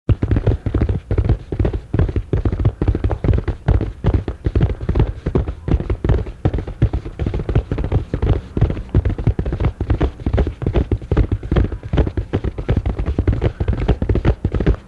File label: Headless Horseman, Halloween Sound Effects